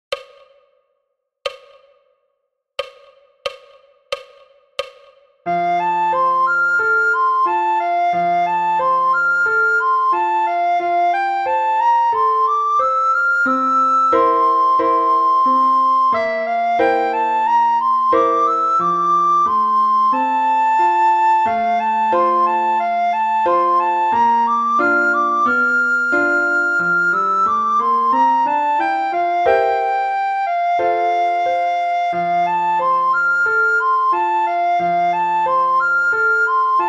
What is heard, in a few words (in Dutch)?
do mi sol, sopraan rustig; The aristocats